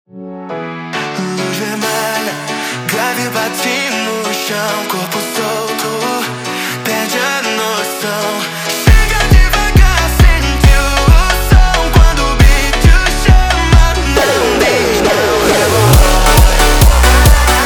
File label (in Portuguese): Montagem